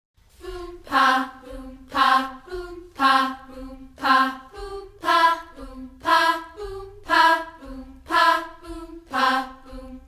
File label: Boompah Pah